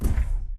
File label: footstep jog; Titanfall 2